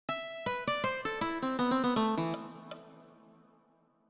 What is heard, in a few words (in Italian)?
esercizio 3 frase arpeggio A minore, guitar